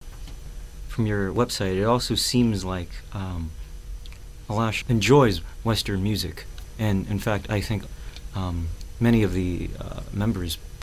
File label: Western music